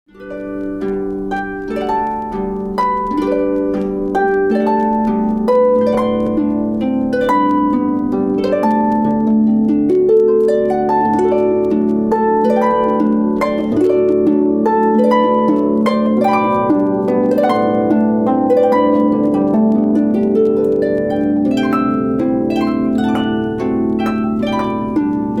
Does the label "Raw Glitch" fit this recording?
No